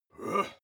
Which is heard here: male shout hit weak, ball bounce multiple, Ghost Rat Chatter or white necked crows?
male shout hit weak